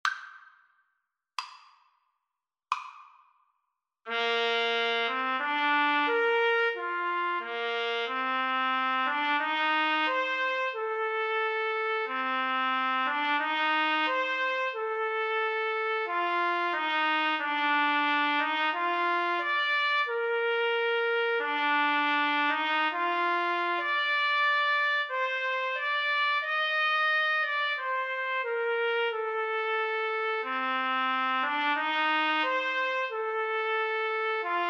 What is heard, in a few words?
pongauer jodler TPT duet, Trumpet Duet